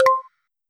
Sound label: click sound